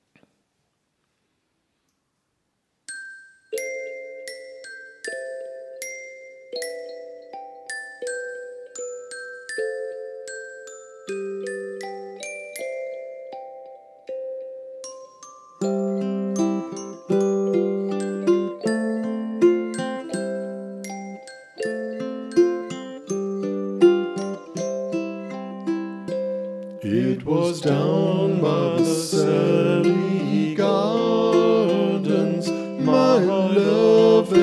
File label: acapella; Sally Gardens